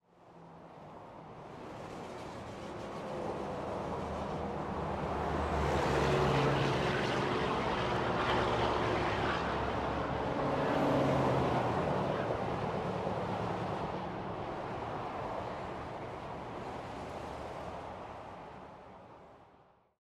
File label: highway; Minecraft; liminal-industries